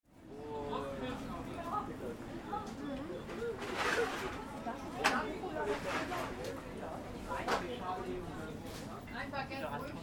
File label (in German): biomarkt ottensen